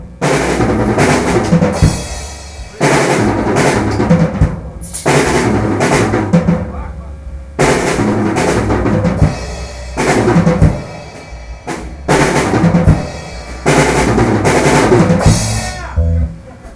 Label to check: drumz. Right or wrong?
Right